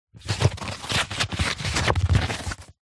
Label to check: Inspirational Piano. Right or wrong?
Wrong